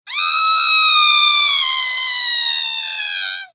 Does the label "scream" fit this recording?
Yes